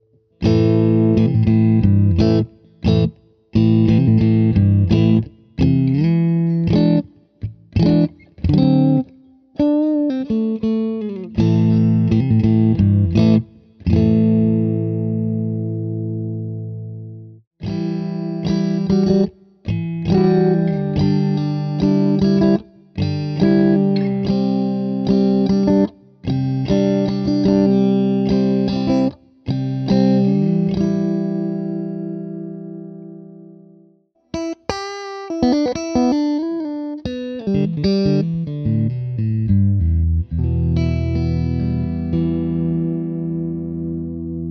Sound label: clean